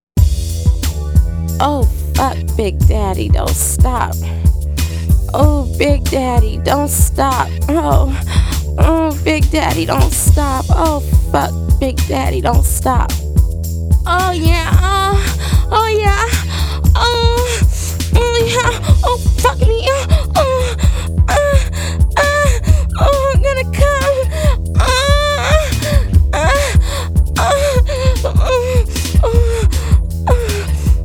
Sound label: Extreme Orgasm